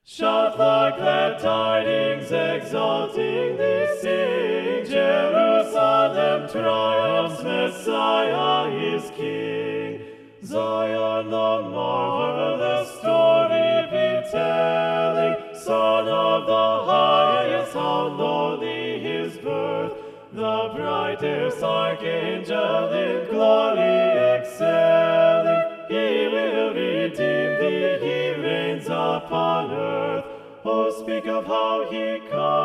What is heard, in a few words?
SATB